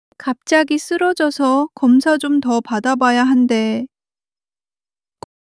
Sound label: crying; monologue